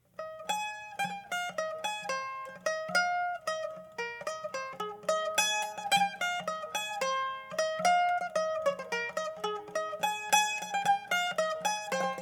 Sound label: Guitar; Assassin's Creed Unity Dead Kings